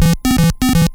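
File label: water, ATARI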